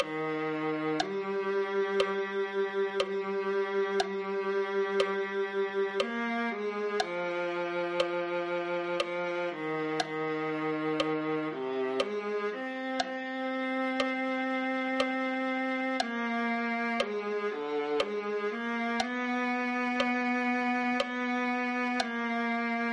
viola

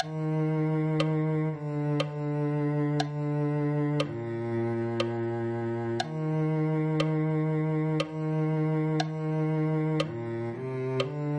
cello

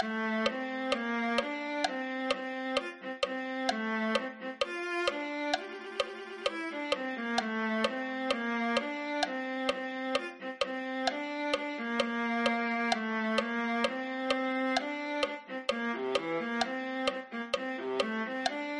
viola

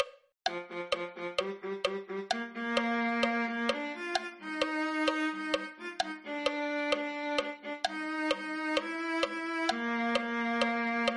viola

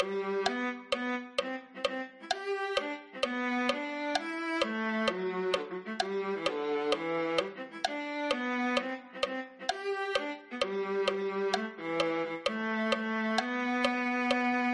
viola

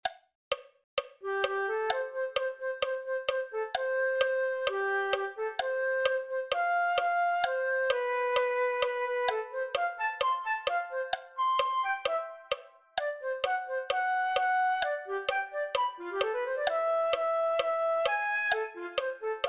flute

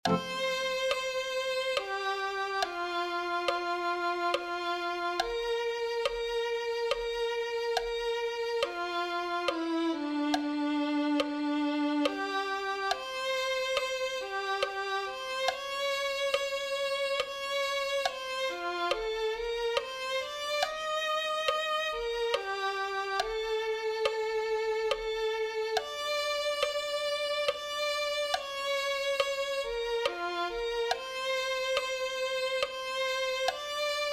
violin

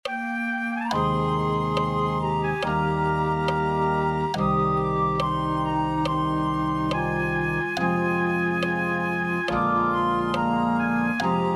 flute

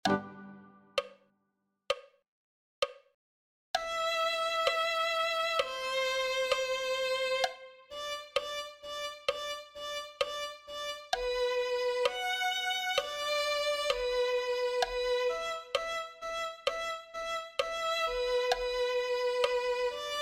violin 1

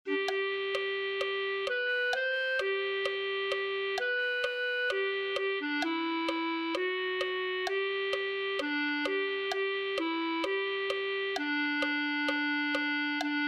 clarinet

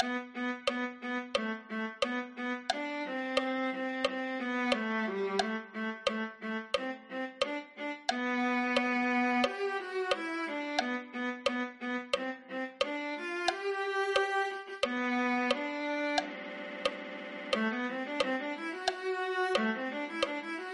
viola

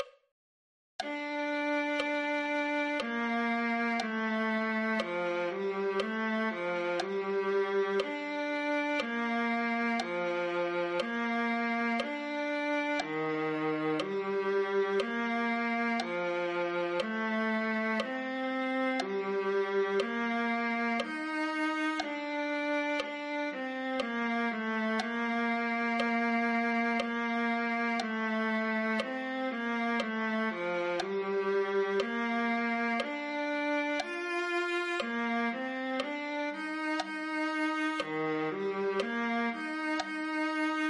viola

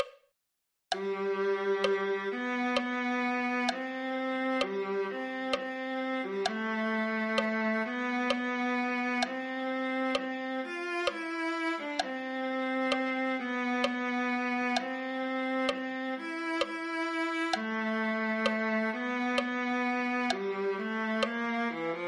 viola

